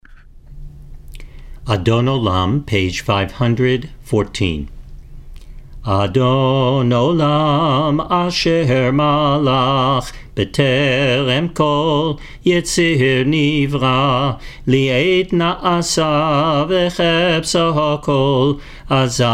adon olam

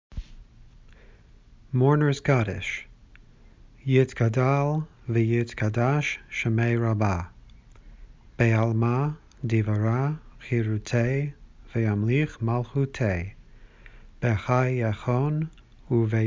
Kaddish Sim Shalom

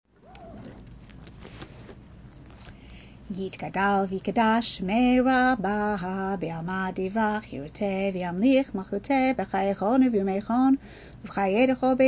kaddish shalem weekday; weekday shacharit

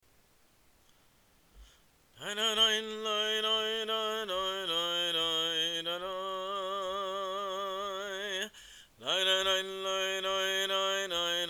nigun revelation; Cantor

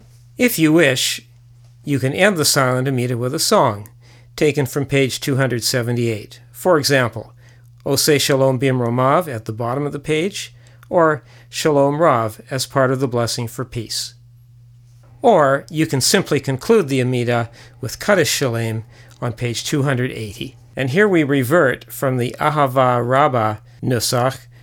Kaddish Shalem; Weekday Maariv

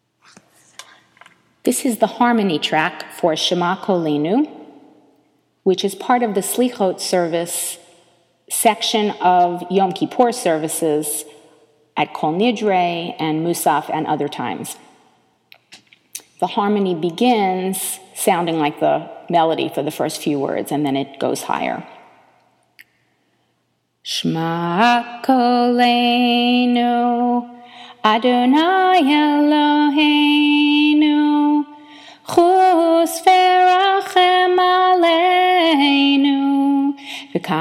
Shema Koleynu Harmony

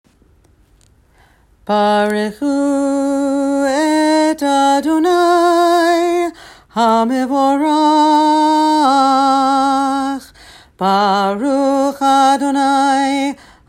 barchu

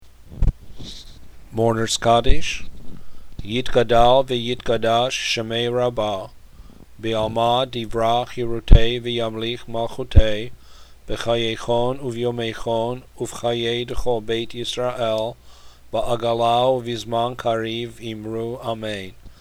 mourners kaddish

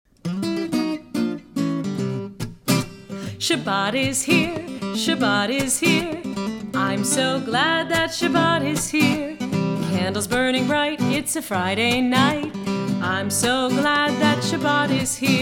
preschool medley

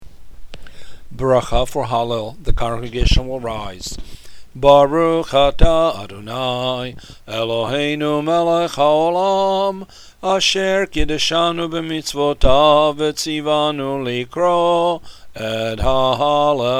hallel bracha

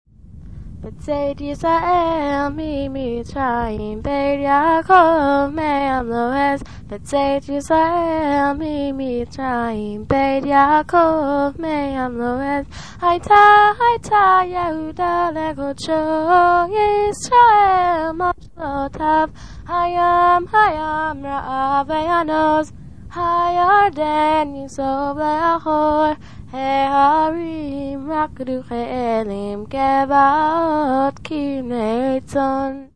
Hallel; Music Shabbat Morning

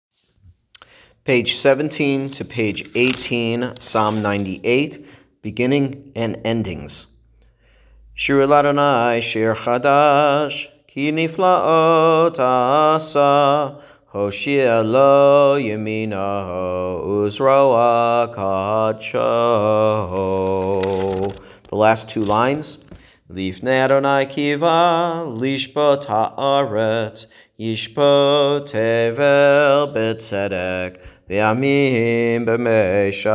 Psalm 98; beginning and ending

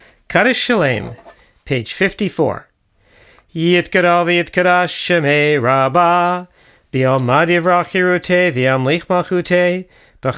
Kaddish Shalem; Shabbat Maariv

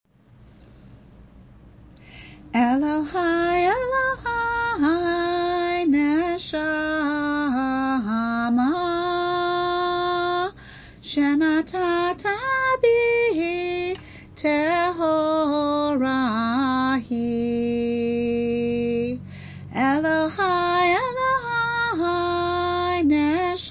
elohai nshamah, melody

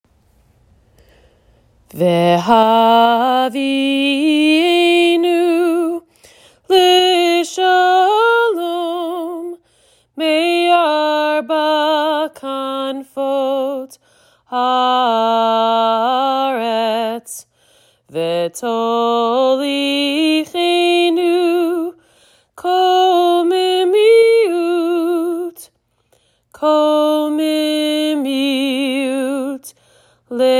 Vhavienu

